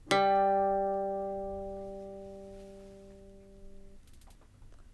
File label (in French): Gaucher sol